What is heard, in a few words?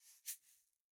shaker single hit; Raw Perc One Shots; Slate; SD SEISMIC Drum Sample Pack